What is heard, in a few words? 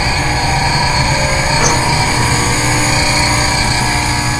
crane magnet grab; Source Engine; video game